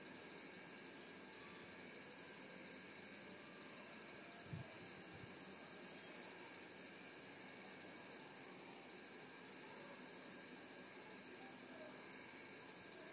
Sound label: rainforest sounds